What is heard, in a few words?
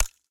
step, spider witch; Fate The Cursed King; MONSTERS